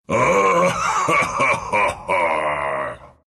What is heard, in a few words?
laugh ru; Wraith; Dota 2